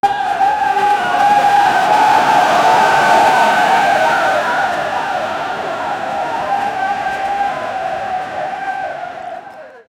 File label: the trible people croud, tribal